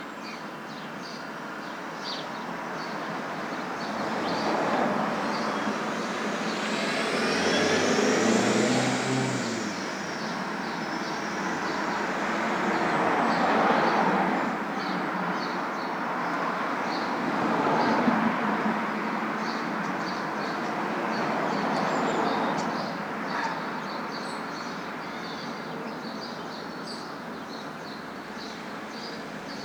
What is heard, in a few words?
city traffic background ambience; mixkit